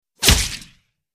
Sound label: Bullet Whiz Wet Body I